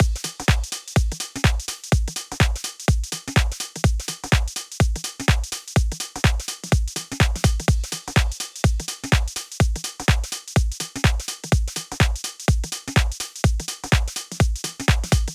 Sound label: algonaut beat; Drum Machine